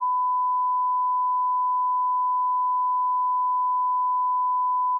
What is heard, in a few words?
sine